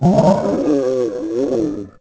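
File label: scream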